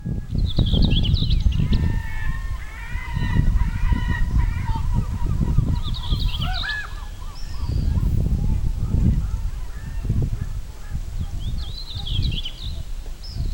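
Sylvia communis rubicola song subsong background Larus mongolicus Corvus corax Carpodacus erythrinus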